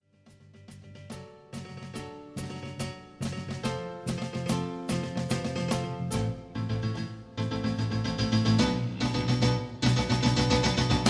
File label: drum solo